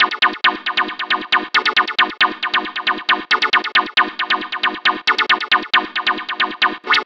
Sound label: Stab 136 G#, Club Techno Stab Loops